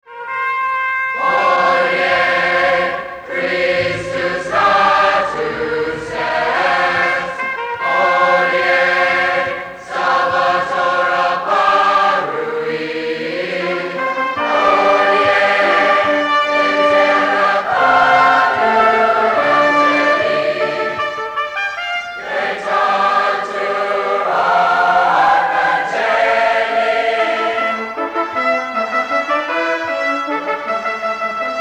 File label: Fanfare